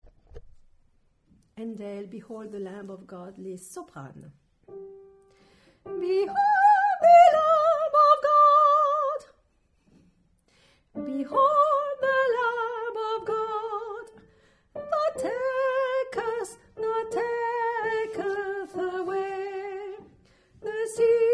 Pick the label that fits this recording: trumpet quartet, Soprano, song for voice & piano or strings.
Soprano